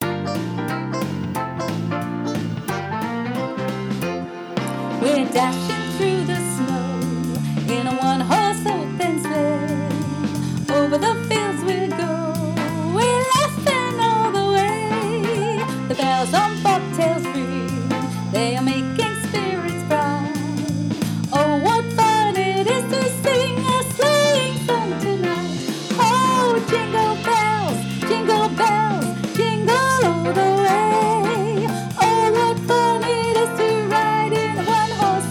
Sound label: Jingle Bells Vocal Track